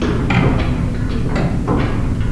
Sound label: Altar Loop; Scion; Playable Tutorial Level; TR1